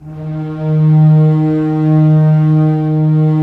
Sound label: STR SEC, Roland LA Composer, Sec Mute St; Roland - LA Composer vol. 1